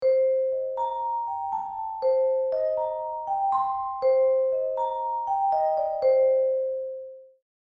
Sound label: Vibraphone mysterious melody